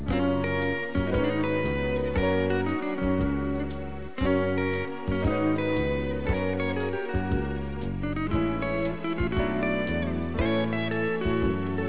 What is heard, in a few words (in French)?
petite musique